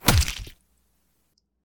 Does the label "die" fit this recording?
No